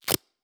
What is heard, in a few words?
Tearing, Gaffer Tape, Big Piece, Close; Soundreorganized; Everyday Actions 1